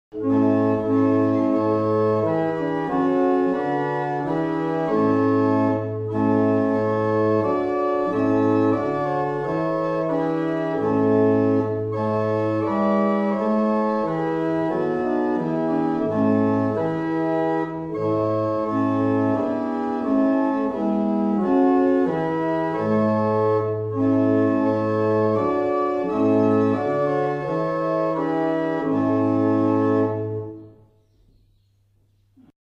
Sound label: barrel organ; Mattishall